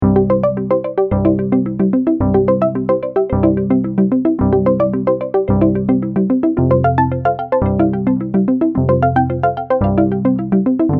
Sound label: No Melody, ukulele; Rainbow Ukulele